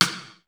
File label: wet side; sr16 sd